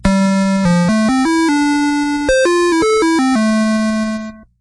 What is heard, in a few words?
floaty